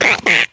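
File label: frog attack; DAoC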